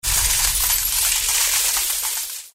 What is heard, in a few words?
coin; egyptslots; zhongjiang